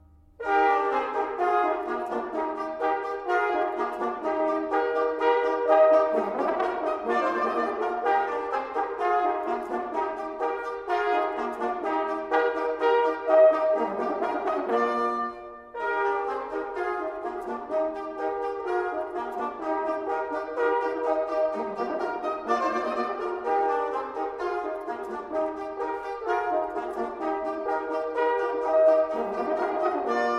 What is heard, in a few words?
trumphet; Brandenburgse concerten; Bach